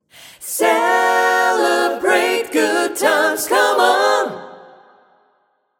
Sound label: celebration choir